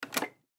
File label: Printer Copy Machine Push Button Beep; Office; Blastwave